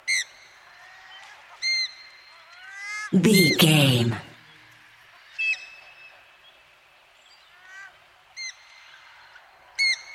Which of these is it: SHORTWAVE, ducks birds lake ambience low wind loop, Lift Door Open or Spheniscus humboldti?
ducks birds lake ambience low wind loop